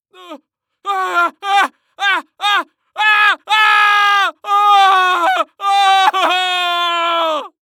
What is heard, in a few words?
Horror Scary Human Male Scream Panic Scream